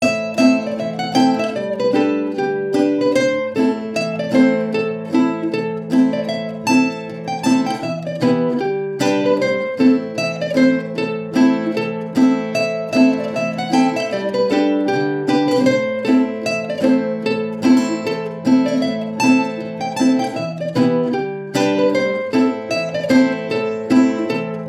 Bard Instrumental Loop

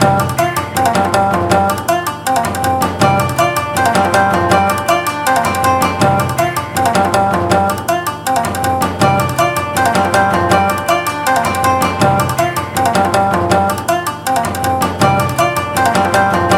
BGM sword Ambient Loop; Shuriken and Aliens

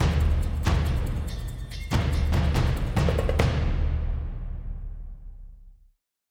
Perc end tag; Medina

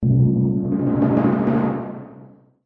Stingers; The Dwarf Run; EV Aux Appear

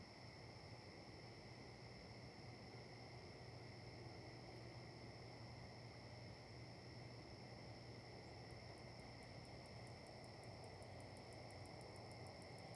outdoor night

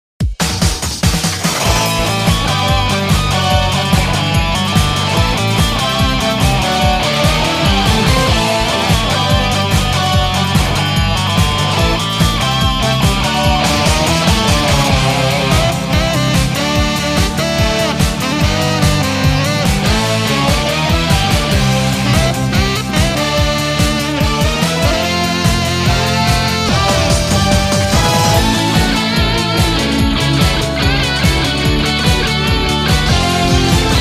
Music event; Music PSN

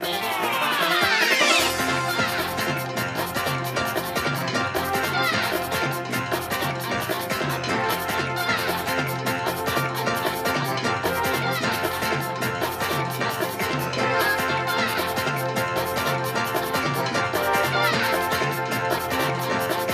BGM; Adventure Player; psp

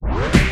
spinup; OK K.O. Lets Play Heroes; mus char group

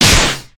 door

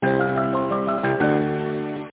Target Reached

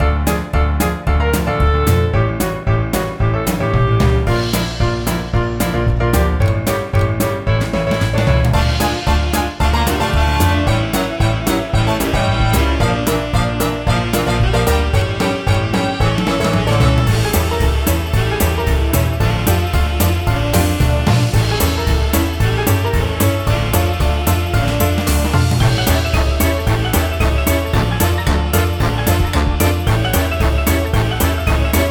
LOOP Mission; Adventure Land

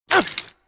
Character Red Hit; Angry Birds Epic

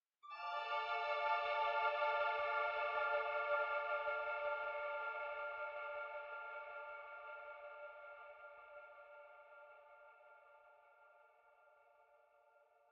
Success Stinger; Forest SFX

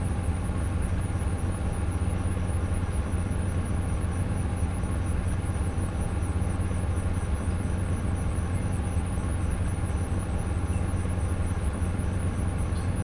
Ambient Greenhouse; Among Us

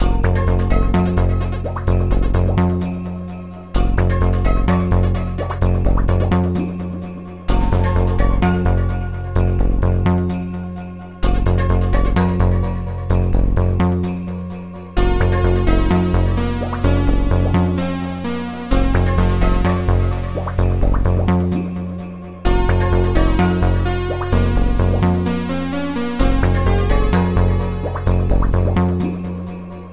Cave Loop; Mission in Snowdriftland

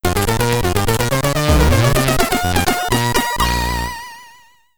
I win! Tune, commodore 64; Fruitbank

